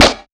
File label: Rim, Percs